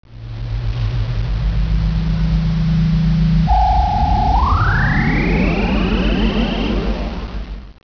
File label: Transmat